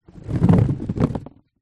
cardboard box full of small potatoes tip potatoes roll; food; zapsplat